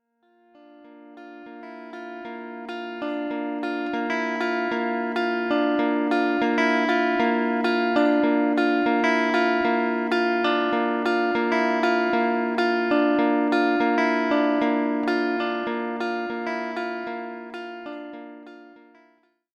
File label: Fade in out